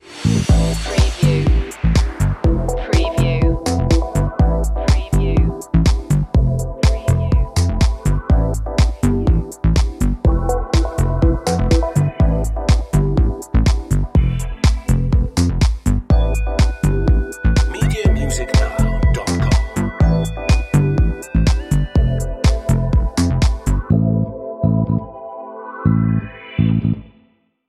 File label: funky slider